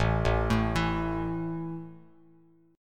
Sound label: A 5th A down Bass Standard; arpeggiated